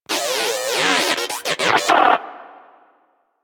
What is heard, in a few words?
Moa Inquisitive Vocal Stomp, Pets; Warframe